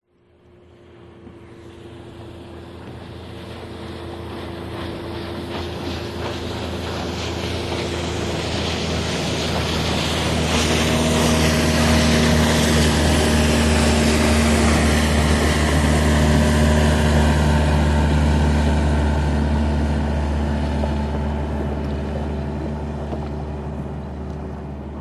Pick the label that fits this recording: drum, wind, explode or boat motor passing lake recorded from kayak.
boat motor passing lake recorded from kayak